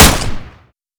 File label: silverballer